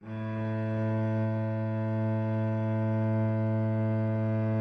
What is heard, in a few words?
cello